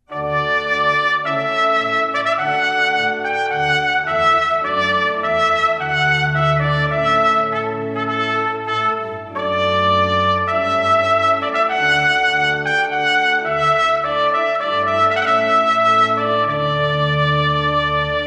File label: Trumpet